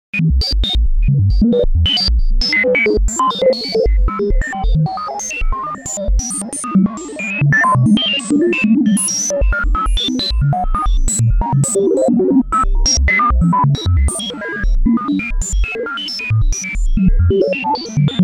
Robot Brain, 70s Sci Fi

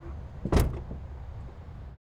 Ford, Fiesta, WRC, Door Various; 2016; Pole Position; Decoded

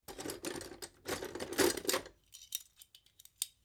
Hand-Drill Removed From Toolbox; Construction; BBC Sound Effects Library

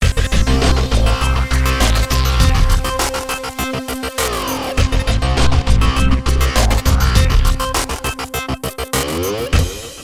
Signature Sound; FXHome; Signature Tracks

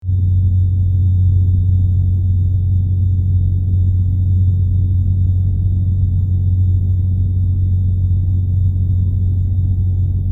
generator; FXHome

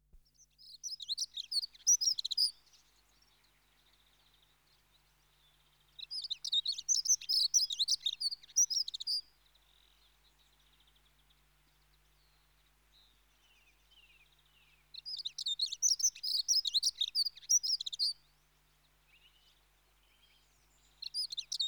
Dunnock (Prunella Modularis) With; Birds; BBC Sound Effects Library

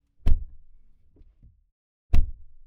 Dodge Challenger Shaker SRT8 Door Interior; Dodge Challenger Shaker SRT-8 2015; Pole Position

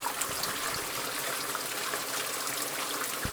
frozen waterfall; Sound Design Tools; Raw Foley

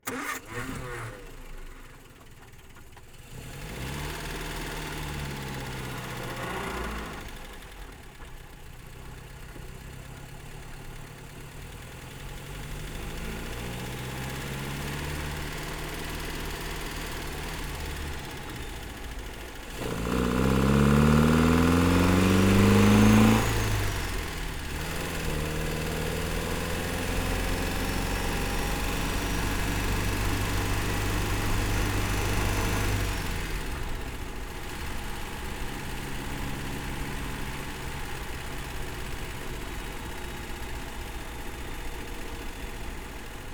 MG TF1500 Country drive Engine, anno 1954; On The Fly Audio

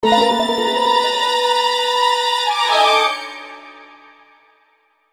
Orchestral Phrase; FXHome

